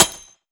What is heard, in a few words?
frag physics bounce concrete hard; gmod; viper